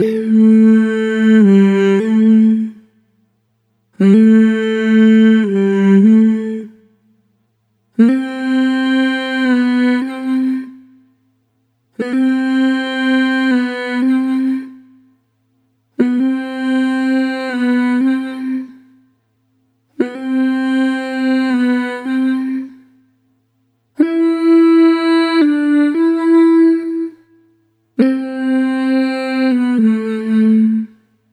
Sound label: Voxophonium - Dry; Sonic Foundry (Sony Creative Software); Pocket Diva World Class Vocal Samples